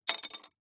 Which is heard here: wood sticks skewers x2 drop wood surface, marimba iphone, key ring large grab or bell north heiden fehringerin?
wood sticks skewers x2 drop wood surface